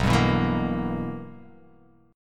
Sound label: B Major 7th Suspended 4th Sharp 5th, chord